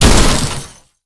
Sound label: jeep crash hard